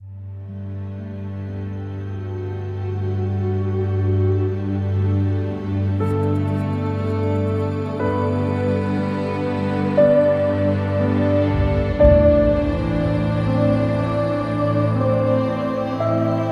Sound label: Peaceful Ambient